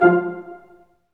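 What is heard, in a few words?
Large Ensemble, Brass Staccatos; Roland LCDP08 Symphony Orchestra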